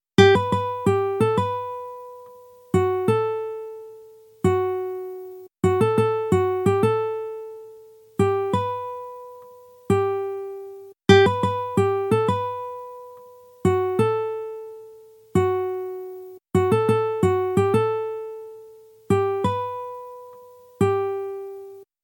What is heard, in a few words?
alto